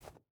footstep rubber boots snow jump start; Rust

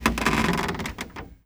foot wood creak, footsteps

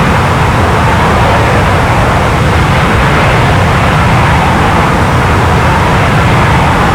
Su25 Back Engine; DCS

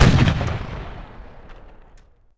Cannon Fire; Civ4 warlords

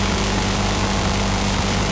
mg 20mm M61 loop

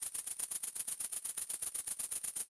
crickets; ambient rudniki

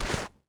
asphalt slip

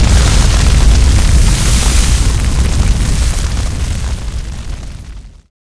fire huge die

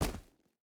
footstep rubber boots gravel jump land; Rust

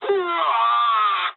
jp aircraft destroyed scream, speech jp

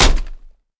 impact helmet; Escape from Tarkov

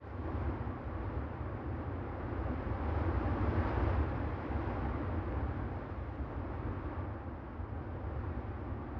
ambient battle; World Of Warships

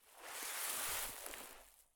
prone grass low; Escape from Tarkov

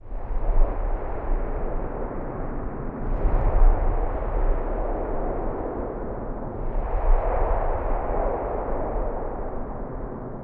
combat atmosphere; World of Tanks

